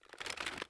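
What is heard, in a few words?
inspect movement; Valorant; marshall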